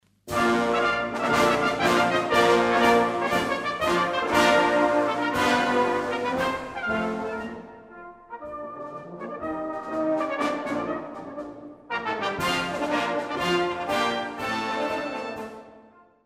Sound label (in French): point d orgue